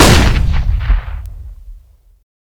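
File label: Weapons Flashbang Explode; Wepons; Counter-Strike: Global Offensive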